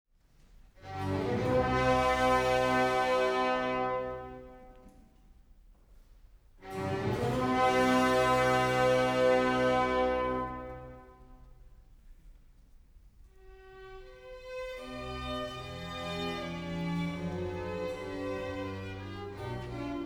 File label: baroque orchestra live introduction; new baroque orchestra berlin; cartellieri